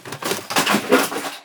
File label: box falling